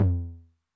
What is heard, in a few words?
Med Tune Med Decay, 909 LOW TOM; classic machines; LT - LOW TOM